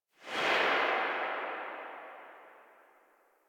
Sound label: Rifle Tail B; Weapons; Warframe; Tenno; Bard Rifle